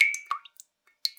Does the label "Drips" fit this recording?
Yes